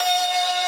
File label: synth main